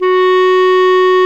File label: Clarinets